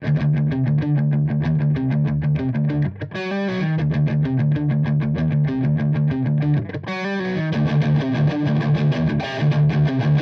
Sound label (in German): Verzerrer Pedale Gain Channel Sweet Spot; Walrus Audio Ages